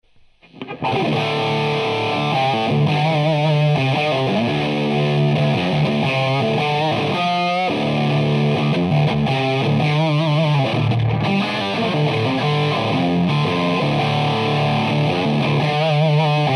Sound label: lead